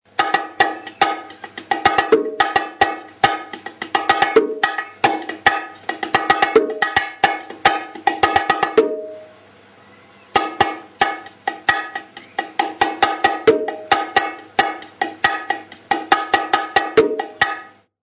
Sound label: SIMPLE MARTILLO, bongo